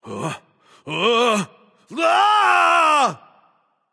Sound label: nv fear, vox; smite; voicepack; vulcan